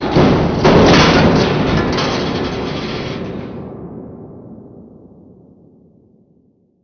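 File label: industrial ambience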